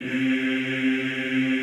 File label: EEH C2, Extended Classical Choir; Best Service